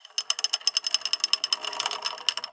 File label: Chains pull Chain Hoist; Just Chains; soundbits